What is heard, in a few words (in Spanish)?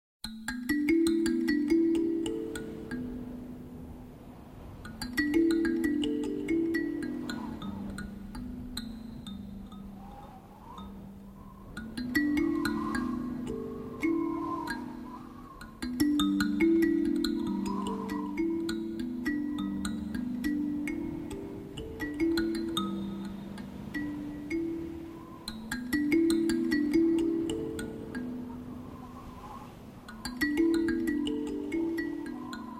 Introduccion Kalimba